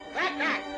ack ack

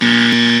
bzzzzzzzd

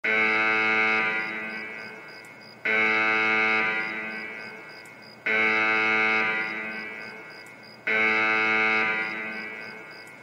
alarm type beat

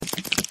spider walk; minecraft

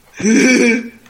hehe

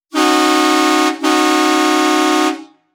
truck horn

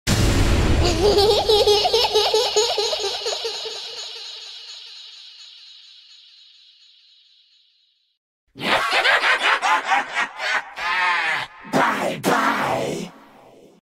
teddy bear laugh; Call of Duty Zombies

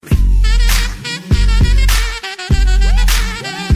saxophone bass

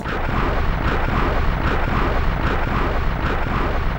mechanized steps

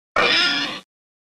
pig sound effect

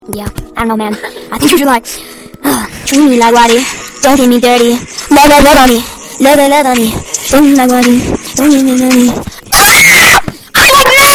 random kid singing bruhhh

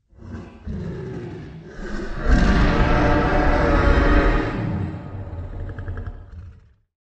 dragon roaring

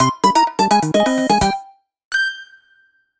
death fanfare; kirby

